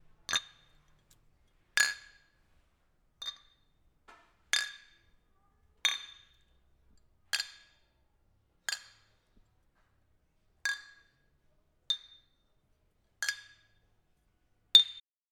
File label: Cheers Clinking Glasses